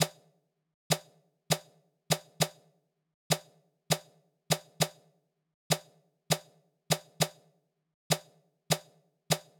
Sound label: Drum Loop - 100 BPM Percussion, Afrobeats; Loop Stems; Cymatics; PARADOX II - Drum Loop Collection; Duppy Drum Loop